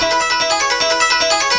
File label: Arp, Keyboards